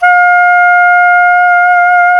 Soprano SAX mf F5; Giga Samples Collection

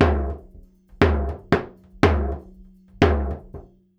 120 TOMS04

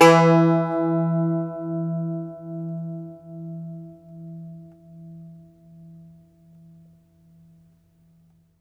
IRISH BAZ, Plucked Strings, Irish Bazouki

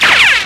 RAVE

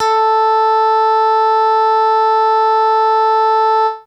Electric Bass, FAIRLIGHT; Best Service ProSamples vol.55 - Retro Sampler

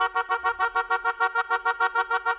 Oboes; Sonic Foundry (Sony Creative Software); Orchestral VOL-2; Modern 22

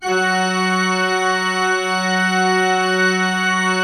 F3, Cathedral Organ; ILIO; Propeller Islands Cathedral Organ

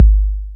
TR-808 Kick, Roland TR-808

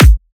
drum kick harder; Virtual Riot; Drum Hits

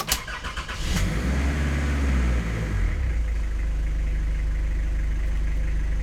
engine start; AKAI S6000 CD-ROM - Volume 6; Transportation

